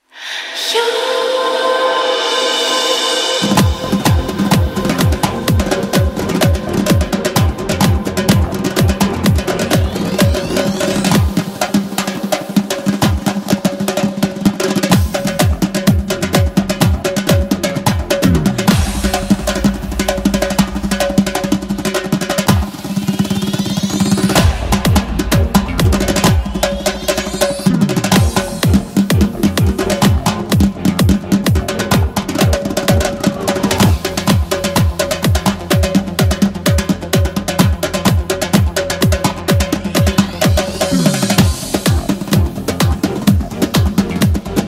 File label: Solo Dhol